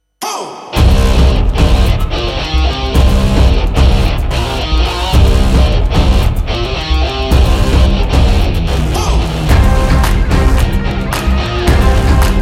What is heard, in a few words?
powerful anthem